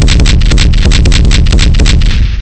combo

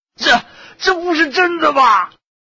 Monster Death; yule; fdfish

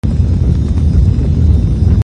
Reels Spin; slotssister

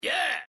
man enter free cheer; gonzosquest

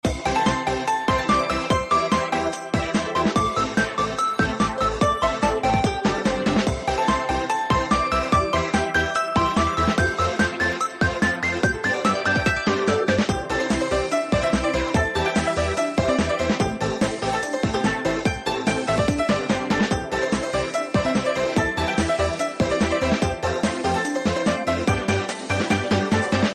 free spin bgm

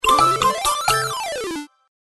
Line Win

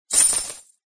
sound bet high; yule; car

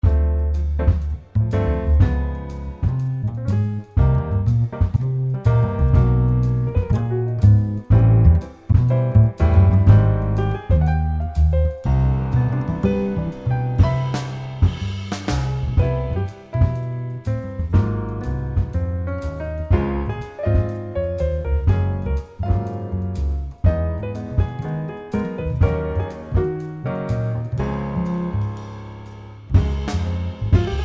back Music

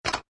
UI Stop

